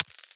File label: step, headcrab black movement